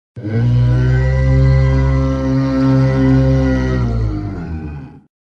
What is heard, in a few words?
brute groan; amnesia